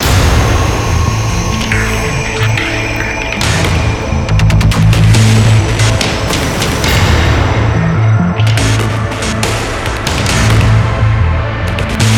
dubstep

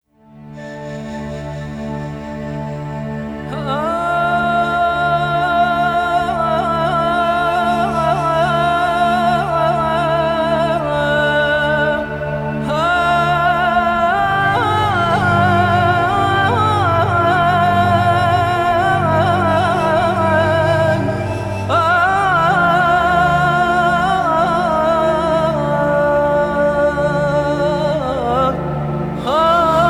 female buddha prayer

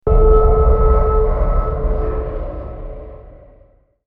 hit complex short

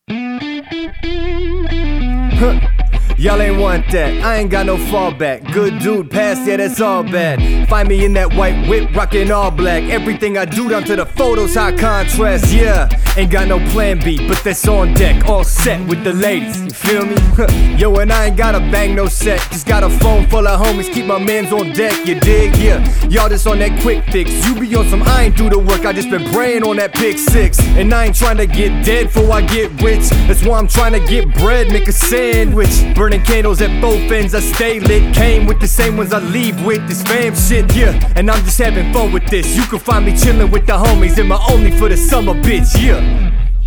guitar funk

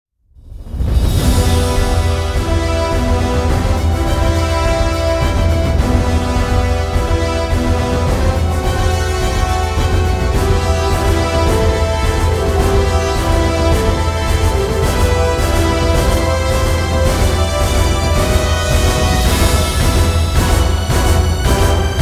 instrumental

